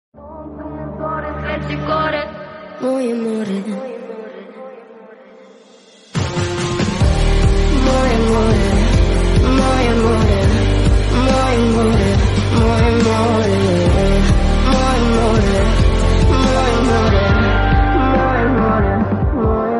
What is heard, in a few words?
Lofi